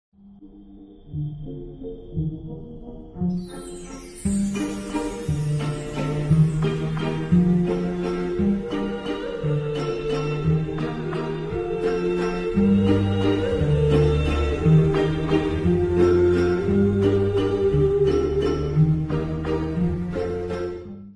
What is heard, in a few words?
violin cover